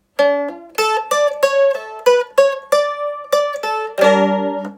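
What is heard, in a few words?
bulgarian advanced intro, mandolin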